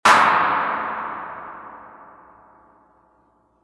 mod reverb tank 2-spring medium drum machine clap

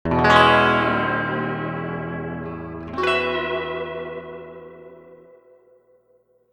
reverb tank 3-spring medium tremolo chords

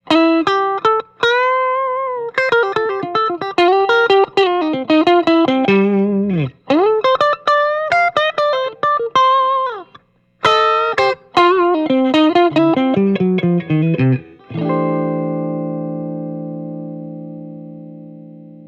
clean strat

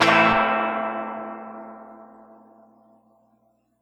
spring medium quick strum

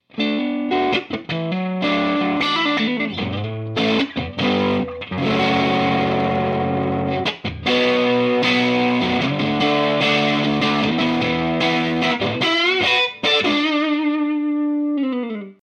overdriven

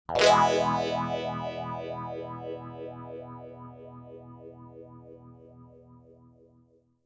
strum wow

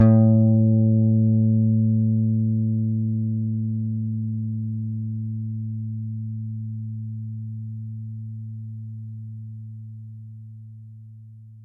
erratic clutch deluxe clean note normal

